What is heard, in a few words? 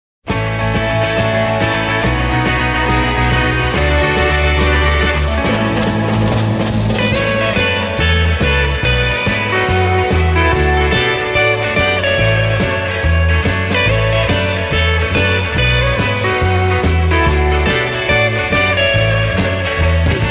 Guitar; Guitar Mania Vol.12